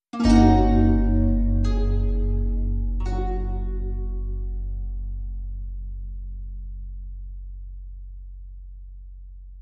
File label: Groovy Vibes Sound Logo Harp